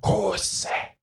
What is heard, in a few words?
Attack; Hollow Knight; Grimm Attack